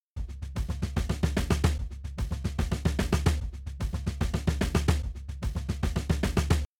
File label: Drums, shuffle drums; WDR2